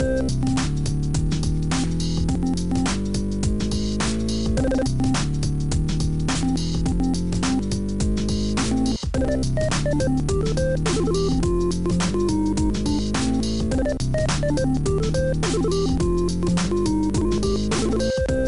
Minigame; Konkey Dong

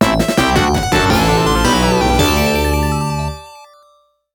Victory

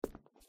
Tile step; The Joy of Creation Halloween

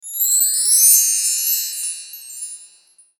Rings; F.A.M.P.S

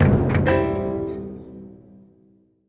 Race stinger

